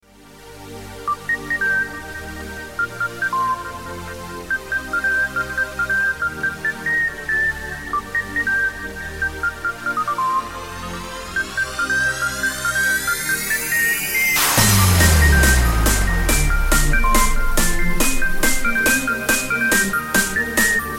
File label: diwali melody tone; ringtones